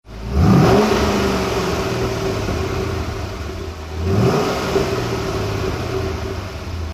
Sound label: corsa exterior revs